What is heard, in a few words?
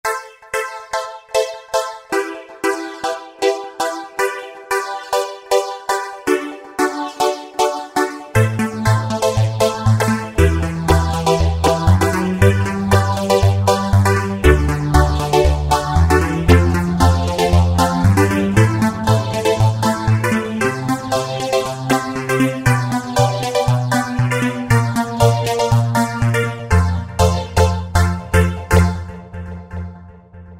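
super saws; Dance; Roland SRX-05 Supreme Dance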